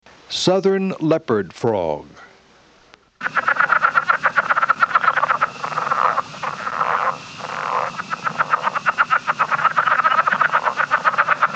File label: Southern leopard frog (Rana sphenocephala); Family Ranidae - True Frogs